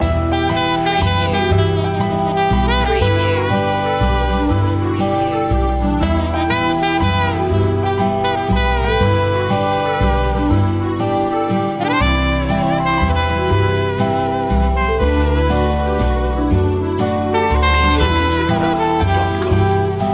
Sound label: waltz street loop